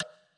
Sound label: glitch tile